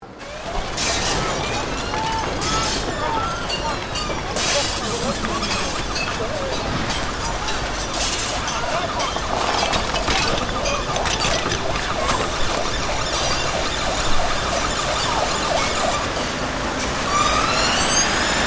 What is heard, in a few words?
Rioting Chaos, Rioting and looting in urban setting with emergency sirens.; Cities; Backgrounds